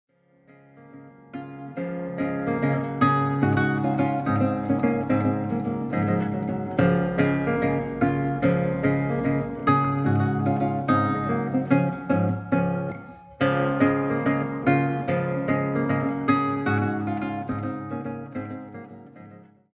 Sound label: Schottische; 1865 Tilton Rochester; Holland